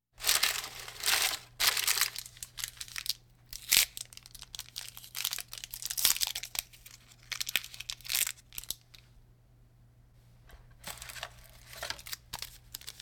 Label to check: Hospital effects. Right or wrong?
Right